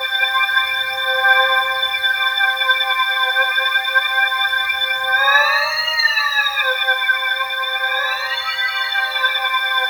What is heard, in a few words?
C5, Synth Leads; 90 s Sample CDs; NiceBeats (NiceBytes); Studioline 09